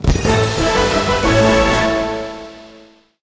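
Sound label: Level Up Jingle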